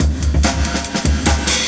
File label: bull